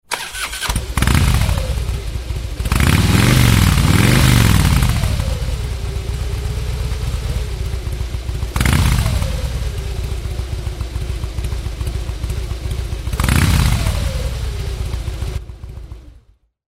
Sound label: Vehicle